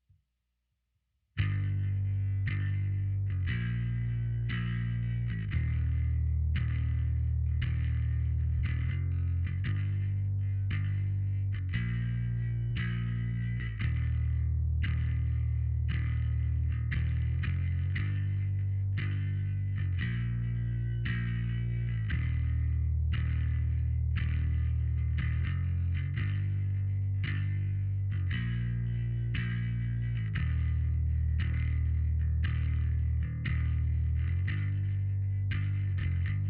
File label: bass